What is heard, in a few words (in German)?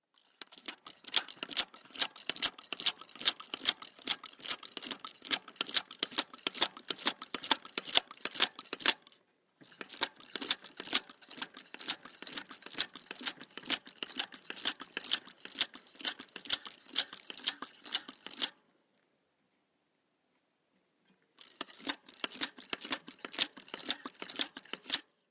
Luft aufpumpen